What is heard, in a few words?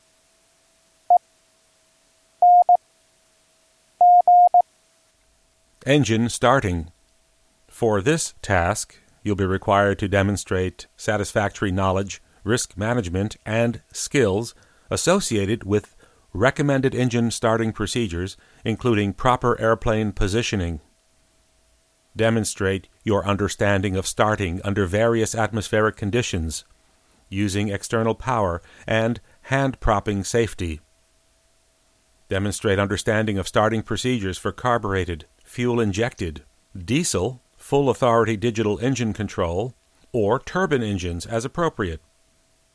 Engine Starting; Private Pilot